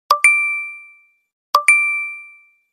iPhone 13 Pro Max Notification; toque de celular

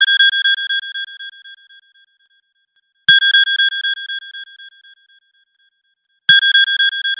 iPhone Sonar; toque de celular

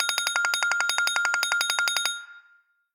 iPhone 12 Pro Max Alarm; toque de celular

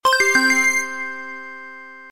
Motorola Windchimes; toque de celular

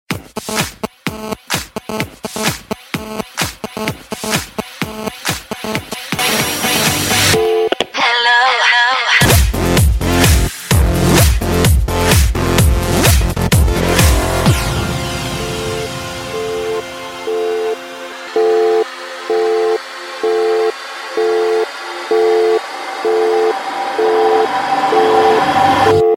Hello Electronic Music; toque de celular

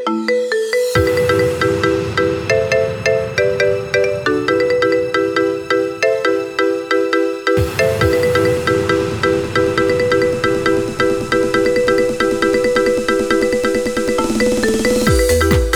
iPhone Marimba; toque de celular

